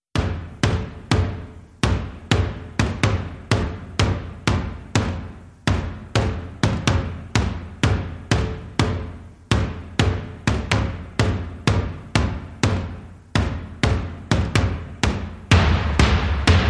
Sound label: Taiko Drums